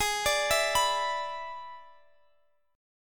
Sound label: E 7th G sharp, guitar